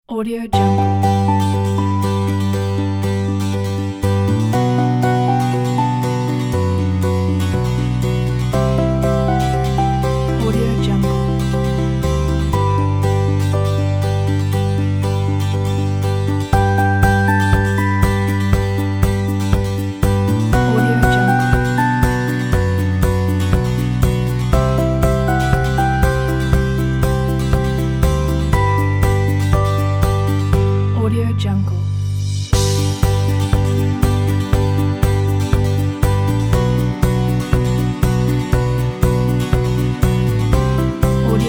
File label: happy folk